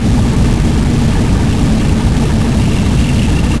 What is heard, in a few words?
Tri Rolling; Mighty Morphin Power Rangers Screensaver